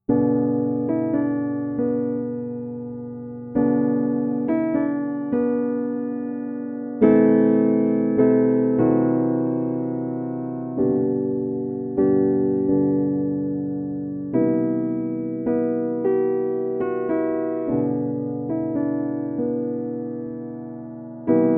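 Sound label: Silent Night, Pianoteq; Close-Side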